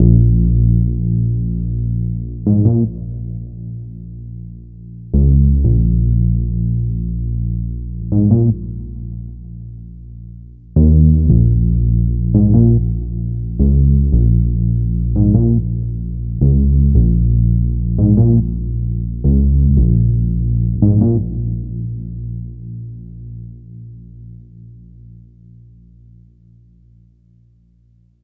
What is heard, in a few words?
Transine Bass, Drum n Bass; KJ Sawka; Drum n Bass & Beyond